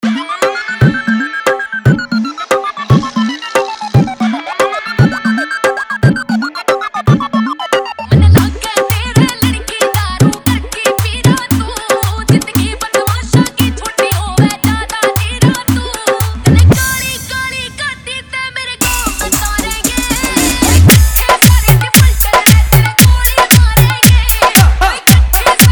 Siren Remix

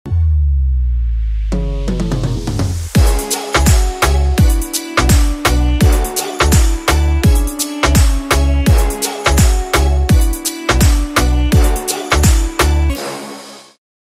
Haryanvi Dj Song

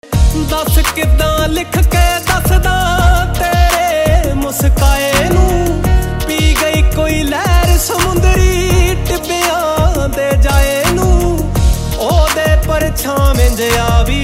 Ringtone Punjabi Song

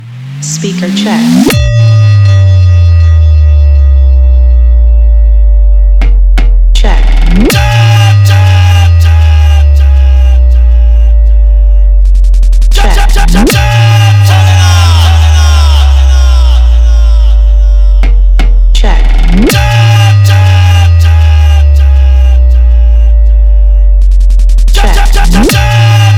Full Vibration Competition Remix Dj Song

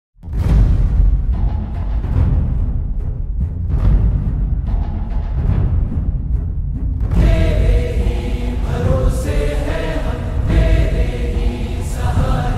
Slowed Reverb Lofi; Adipurush

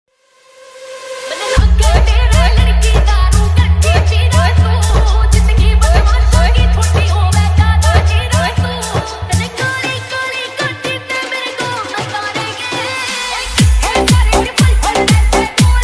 Remix Song

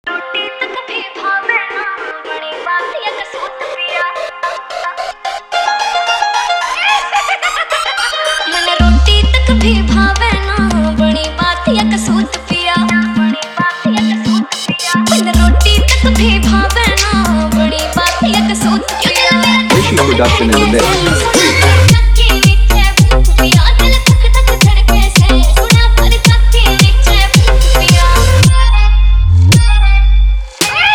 Hard Bass Remix Song

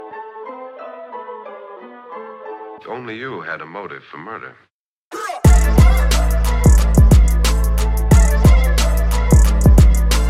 Punjabi Songs

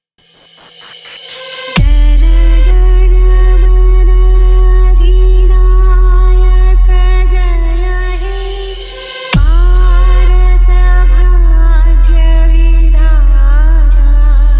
Full Punch Bass Mix DeshBhakti Dj Song

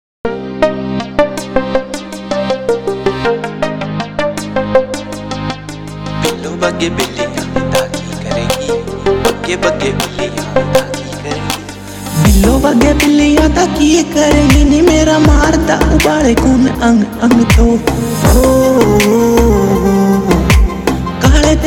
Remix Song

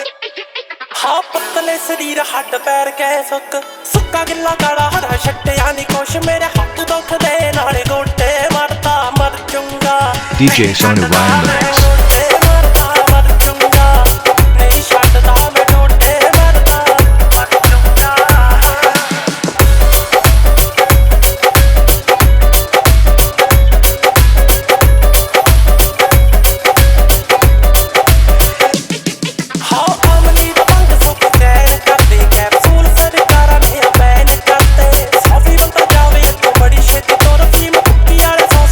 Dhol Remix Punjabi Song

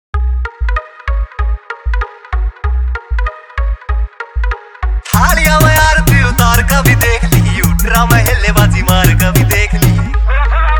Hard Remix New Haryanvi Dj Song

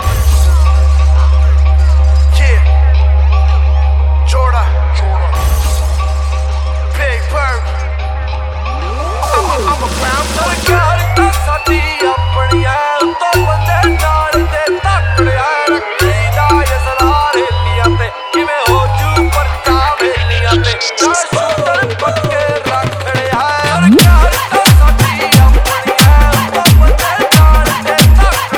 Dhol Remix Punjabi Song

